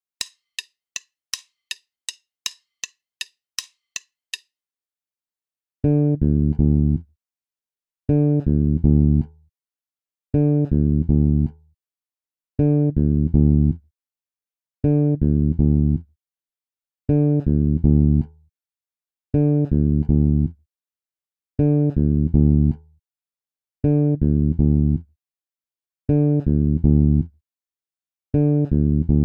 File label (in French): decalage triolets exercice basse tempo 080